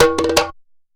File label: SABARO ROLLS; Best Service; World Colours